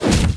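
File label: enemy attack dragon fire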